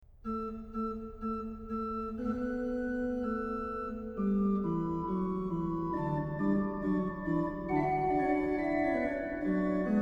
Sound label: Ehre sei dir, Christe, der du leidest Not, organ; BACH; BACH ORGAN WORKS; Chorale Preludes